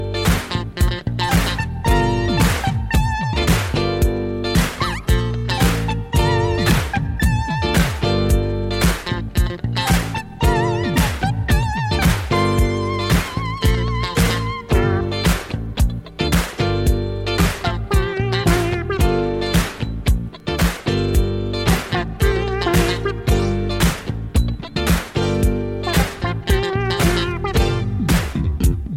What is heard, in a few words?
instrumental